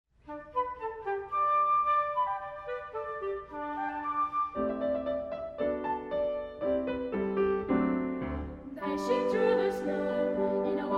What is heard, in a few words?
Gingle Bells, Boys' Choir; Gingle bells (USA); Sofia Boys' Choir